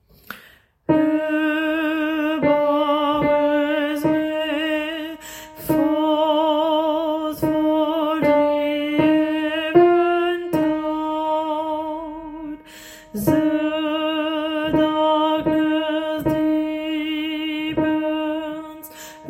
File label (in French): partie alto